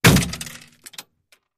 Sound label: Bullet Impacts Metal